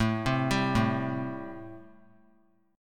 A-Minor 7th A; Am7 arpeggiated; JGuitar

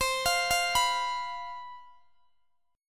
C Major 7th Suspended 4th, Guitar DADGAD

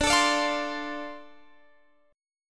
D-Minor 7th D, chord

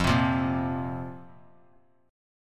F Suspended 4th F; Fsus4 strummed; JGuitar

